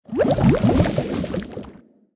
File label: Aquatic Dark Death Underlay